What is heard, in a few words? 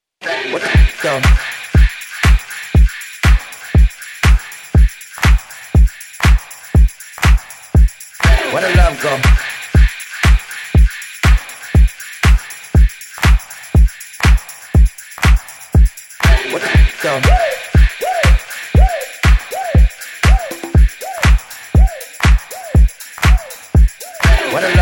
Soul Train Refix; Uproar; Clean